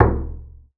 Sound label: BASS FINGER; Xsample VOL-5